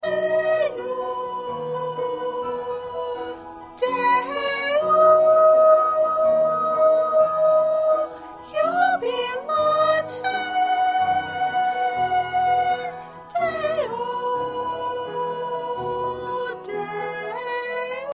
Soprano 1

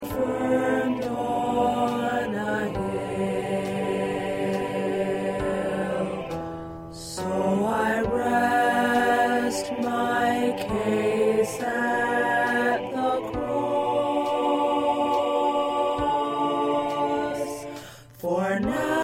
Alto

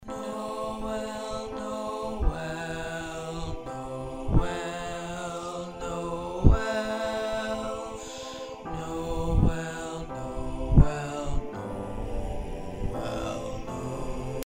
First Bass, choir parts; Nowel

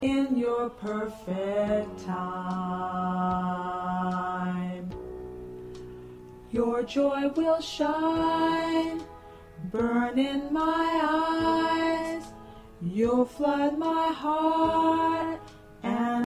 P Tenor

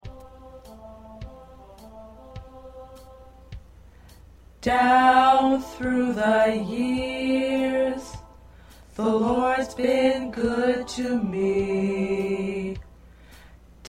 Tenor

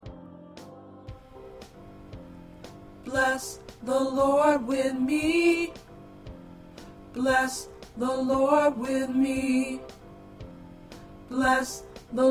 Tenor

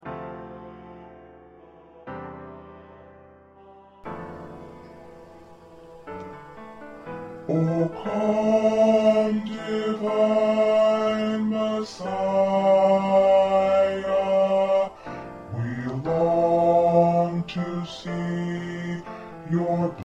Bass

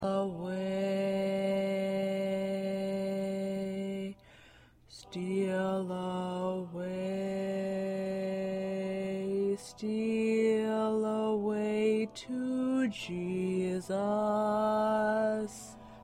Middle Voice

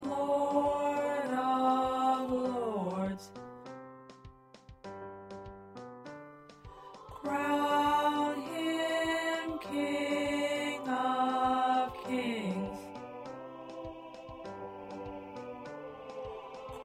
1st Baritone

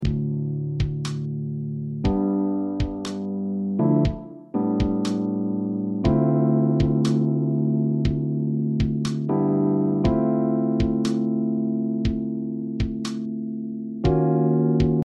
Accompaniment

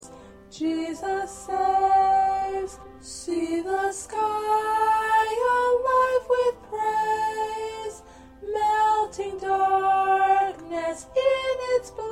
Soprano, choir

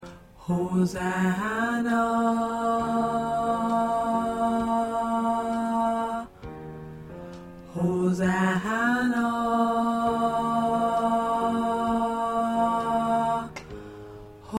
Tenor

